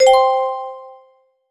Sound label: order filled